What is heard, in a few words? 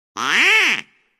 squeaky duck quack